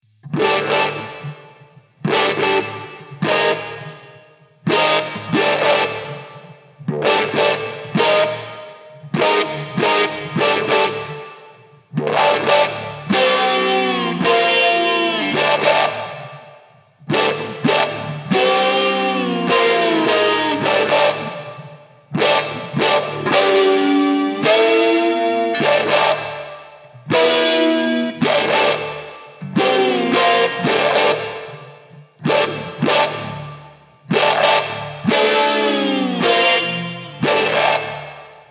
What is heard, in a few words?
Sharp Strumming; moon surface; Hylics